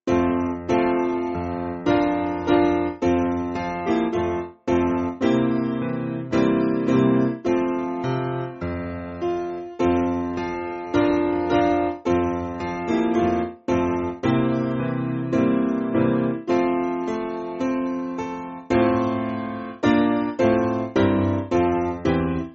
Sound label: Piano, Free Music Backing Files for Churches; Gordon